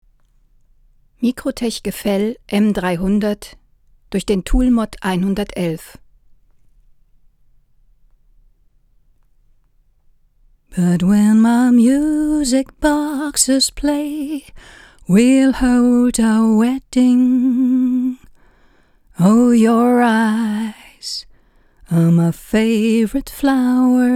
microtech gefell m 300 durch toolmod 111 gesang

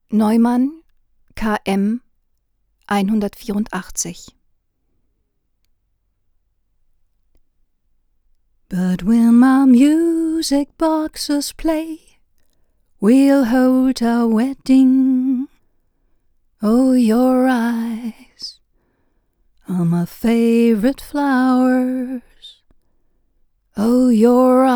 neumann km184 gesang

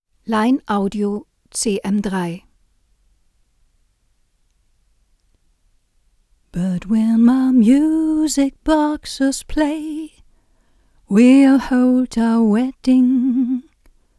line audio cm3 Gesang

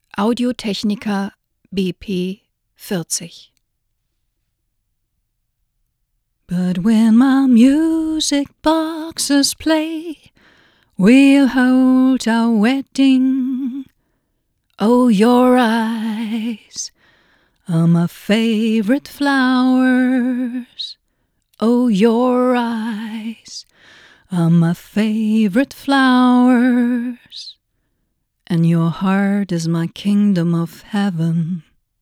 audio technica bp40 gesang

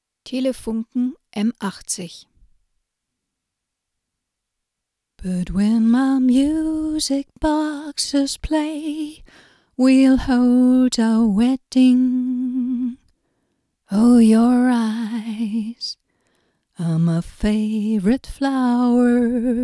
telefunken m80 gesang

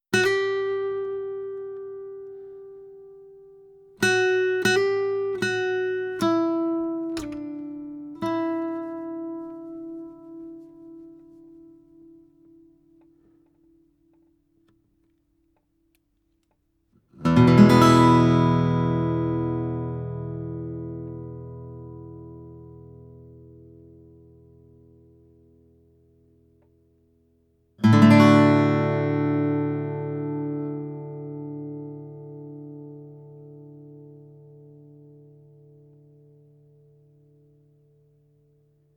neumann km184 gitarre

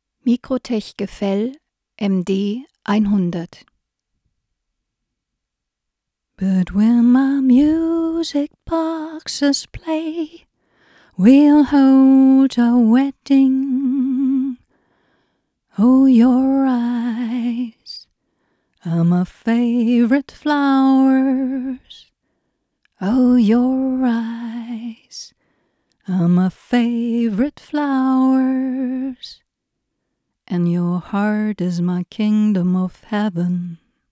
microtech gefell md 100 gesang

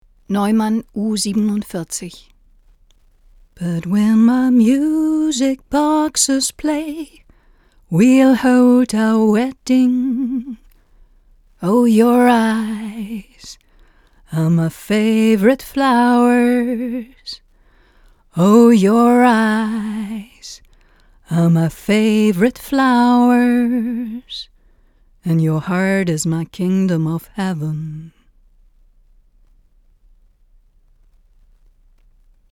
neumann u 47 gesang dry

